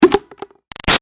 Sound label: Impact Cone Plastic Small Messy Short; Materials; Hollow; BigRoomSound